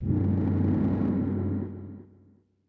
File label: A Rumble Of The Ground